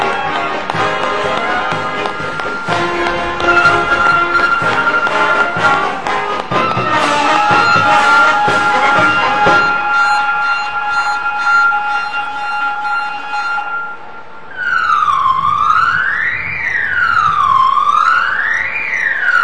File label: alarms; Surface ship - Alarm test during commissioning of USS George HW Bush (CVN-77), heard are various